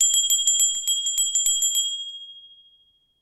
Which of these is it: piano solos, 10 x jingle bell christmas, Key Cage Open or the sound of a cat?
10 x jingle bell christmas